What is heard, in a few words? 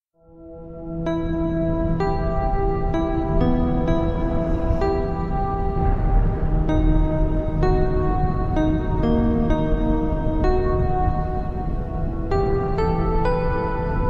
Ambient Space Music